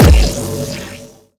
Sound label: Sciweap Shot Beam Small Thermal Defibrillator